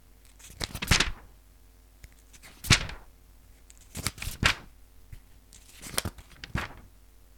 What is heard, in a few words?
Paper turning